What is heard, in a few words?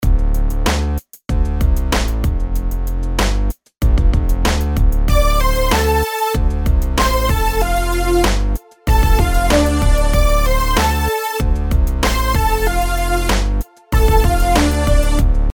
rhythms eighths